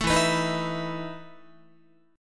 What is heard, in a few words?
E Major 7th Suspended 4th E down Guitar; EM7sus4 strummed